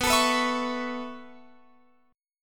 B 7th Suspended 2nd Suspended 4th down Guitar Open F, chord; B7sus2sus4; strummed